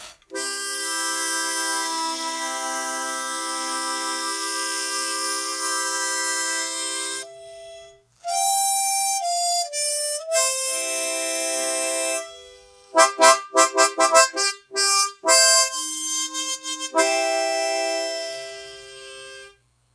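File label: a happy go lucky harmonica, brass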